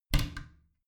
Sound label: bathroom cabinet creak close regular; objekte; tueren